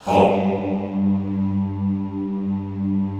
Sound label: HOMMM G1 R, Extended Classical Choir; Best Service